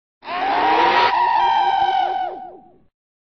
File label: scream